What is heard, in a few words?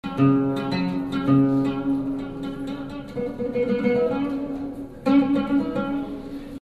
loop